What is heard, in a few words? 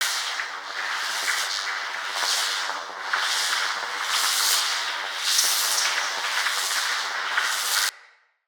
Orb Cannon Loop